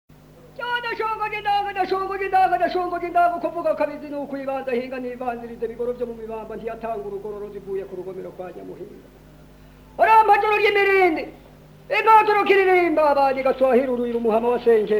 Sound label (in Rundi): amazina ubuhizi, burundi